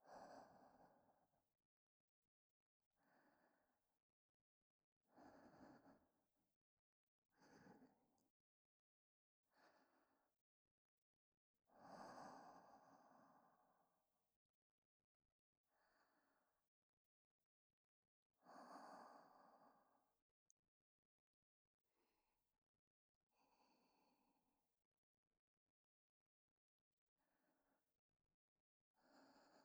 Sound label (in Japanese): 寝息ボイス,ASMR; DayBreakSweet; 月読